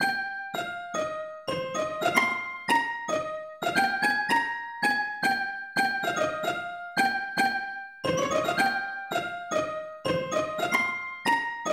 Lead

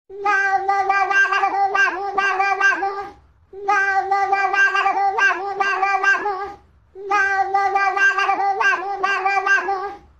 mow tuned; YTPMV; FL Studio

